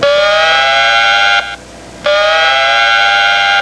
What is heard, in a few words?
modern dive